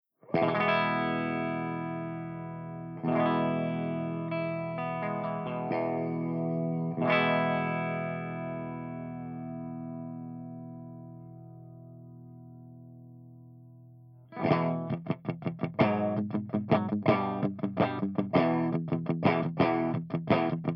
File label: BLACKSTAR HT CH1 CLEAN V30 HB